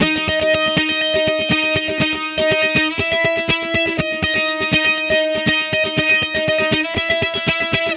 guitar, stringed instruments

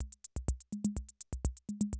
CR78 125, drum loops

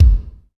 kick boom, ambient kick; Rhythm Section

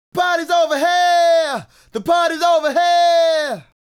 Male Solo, Hip-Hop and RnB Vocals

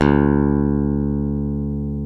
ELECT BASSE, FINGER BS

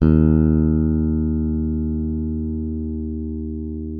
D#2, FINGER MUTE; Xsample VOL-1

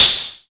FX EFF, ANALOG; Sound & Vision - Gigapack II

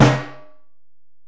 Ac Perc, Drum Machines Alesis DM-PRO

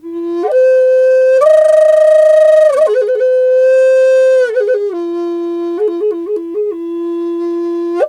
F wild flute

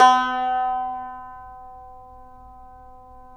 TAR; Roland - LA Composer vol. 3

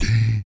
VOC PERC, Vocal Planet Jazz FX BASS PERC

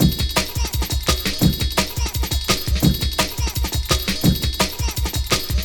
Break, Drum & Bass, Beats & Breaks

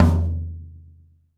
TD-20 Tom A, Roland TD-20 Toms

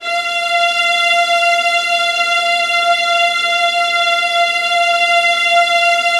VIOLINS G L, Synclavier Strings; ILIO

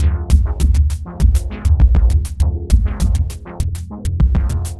Shuffle Shift Beat; Sonic Foundry (Sony Creative Software); Ma Ja Le Ethereal Textures; Beats

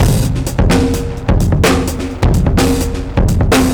Jazzy Drums 128; musicradar

